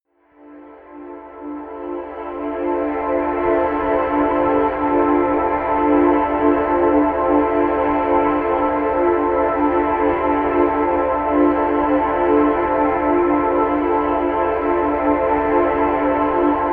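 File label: chrystal air, pads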